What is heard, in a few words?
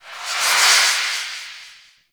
Minigame In Range; Birth Minigame; Warframe; Jade Shadows